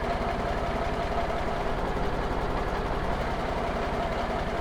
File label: vehicle dumptruck idle; teardown; ps5 windows xbox series xs